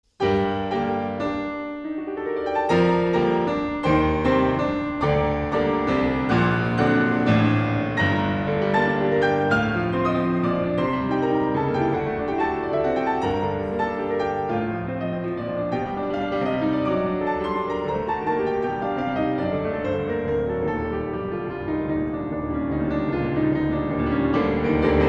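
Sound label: piano sonata g minor; stenhammar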